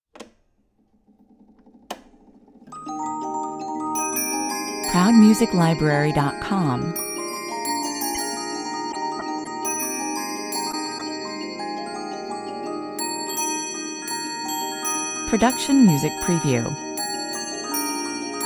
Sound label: music box